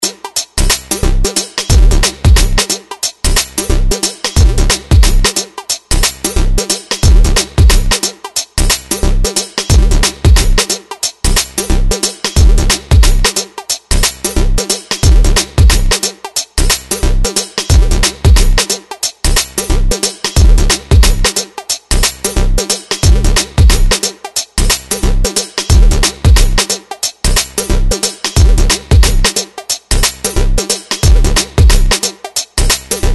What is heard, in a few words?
hiphop